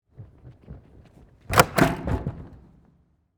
Gymnastics Jump, Sports, Gymnastics, Spring, Board, Jump; Run; Miscellaneous Sport Sound Effects